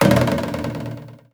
A#3 WARBL, JAPANI PERC; Northstar; The Wizard VOL-1

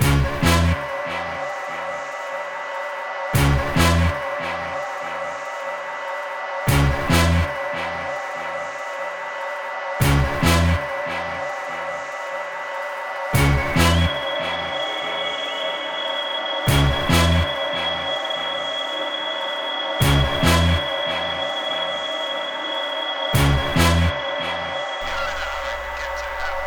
Melody

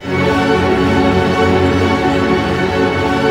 ARP F#, STR ARP MIN; Sound On Sound - Peter Siedleczek's Orchestra

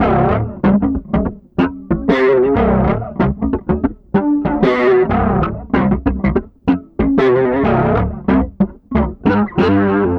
D, Bass, 94 BPM; Sampleheads; Ultra Freakin' Bass

